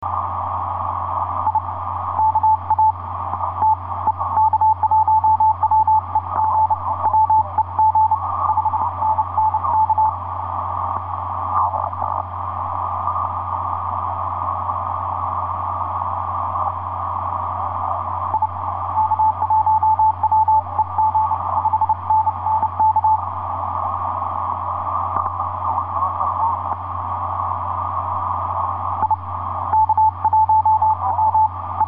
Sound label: 30m cw; j37bo; ik1weg